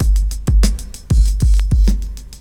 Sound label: DEEP 97, loop; 0- 99 BPM